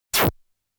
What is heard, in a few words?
Player Shooting Sound; Zero Wing